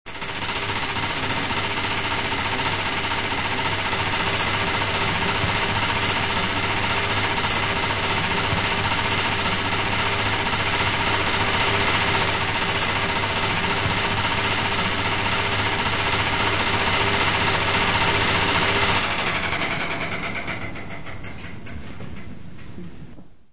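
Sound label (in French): battement projection cymbale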